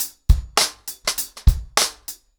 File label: 100BPM, ACOUSTIC DRUMS; The Roots Drumloops